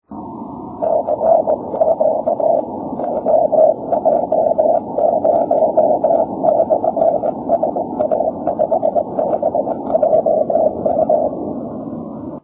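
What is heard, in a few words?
6m continuous wave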